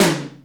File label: Toms Electro